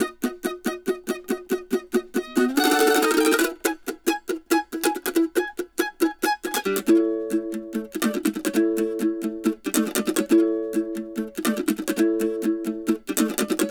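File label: Country Mandolin, Bridge; Digidesign; Pro Tools Essential Loops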